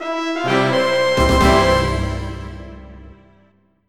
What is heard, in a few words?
flourish; MIDI Music File; 2 channels; General MIDI